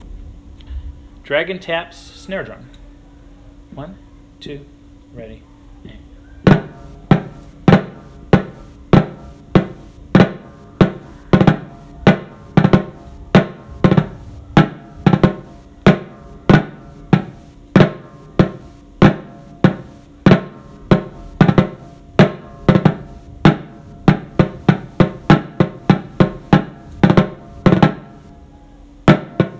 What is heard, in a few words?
snare drum, solo; Concert Band